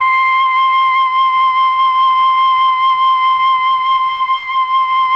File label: MELLOTROF; Masterbits - Vintage Voltage; MELLOTRON FL